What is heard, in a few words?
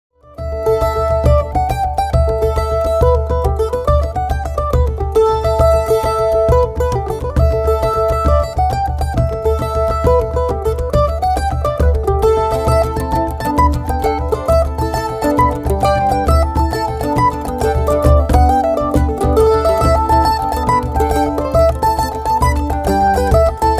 jigs